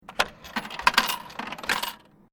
Vending Machine Coins Return Eject, Japanese vending machine; Machinery; Machines